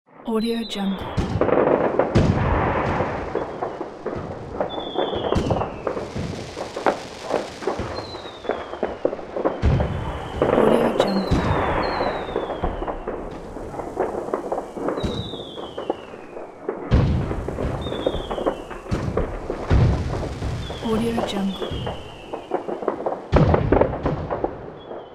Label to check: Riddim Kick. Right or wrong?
Wrong